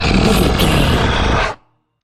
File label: troll monster growl